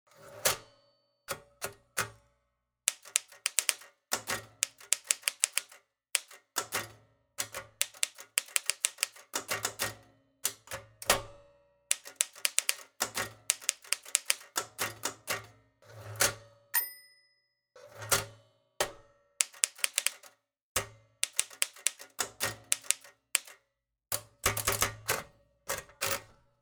underwood basic typing

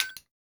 arcade button

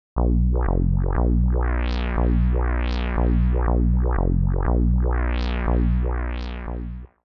mono song pattern

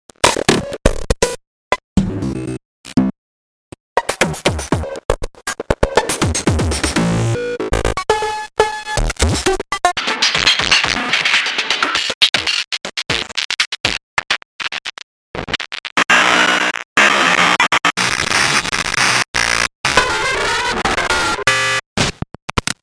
glitch loops

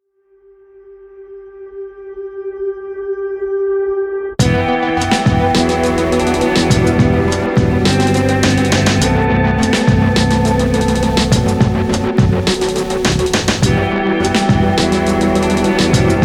full instrume

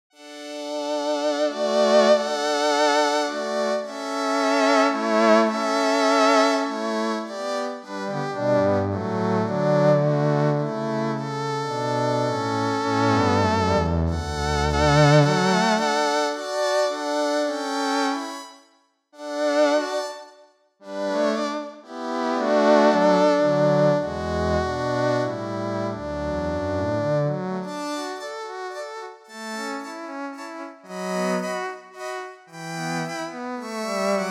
quartet impro

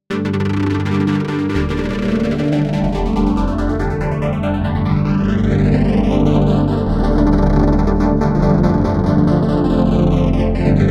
harping voices; opxpro3

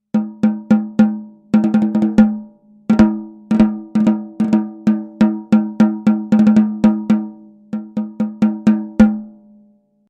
without snarebuzz

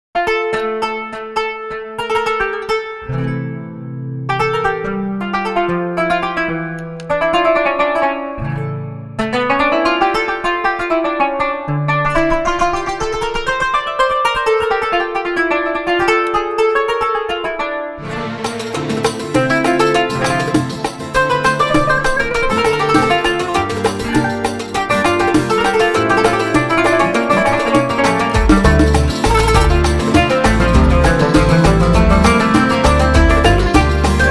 rai

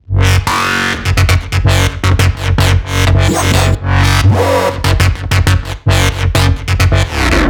future bass house loop

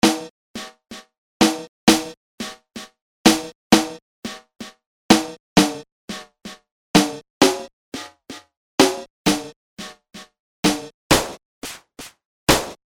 snare; drumodifier

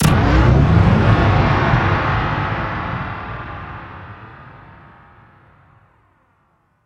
club impacts sound

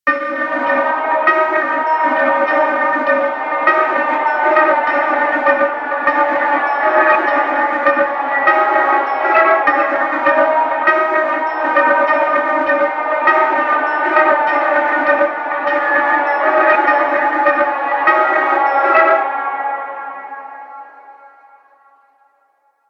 fighting glass

